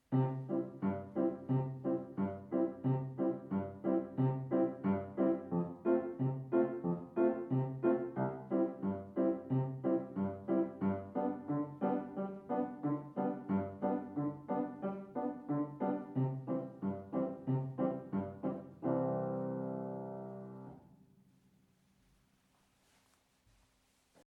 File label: vamp